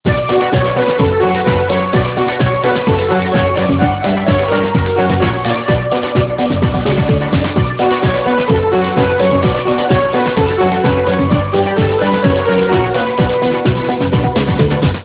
ringtone modern disco

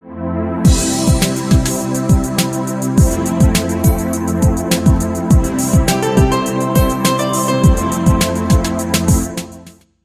ringtone pop shuffle, instrumental

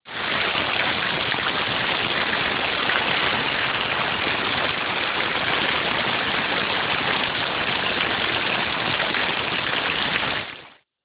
fountain, water

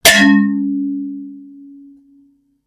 ringtone saucepan

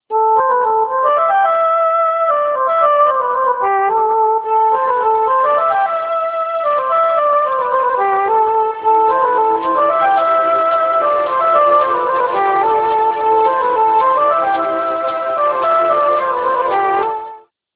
flute solo; instrumental

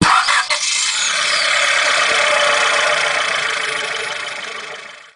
gear, auto

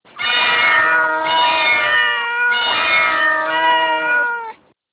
ringtone mega cats

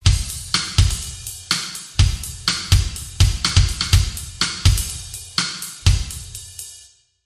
jazz drum; drumbeat

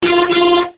claxon; auto

